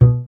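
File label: string contrabass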